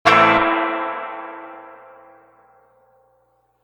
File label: reverb tank 3 spring short quick strum